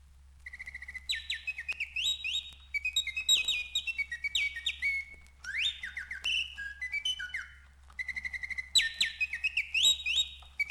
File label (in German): Vogelkafig